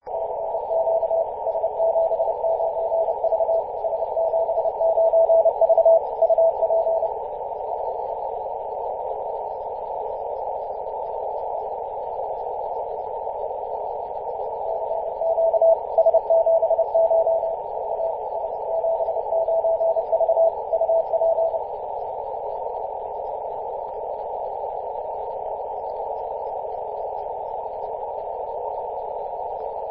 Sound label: 14.008 MHz CW